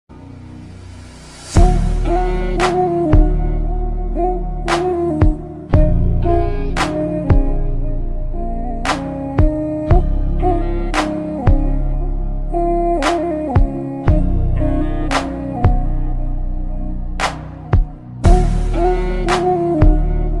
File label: sad ringtone